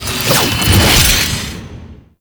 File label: heavy hammer finisher; Enemies; Warframe; Duviri; Dax